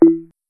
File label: error; luniebox